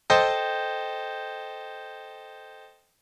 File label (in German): Akkord Q; theorie; Akkorde